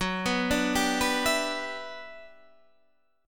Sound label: G Major 13th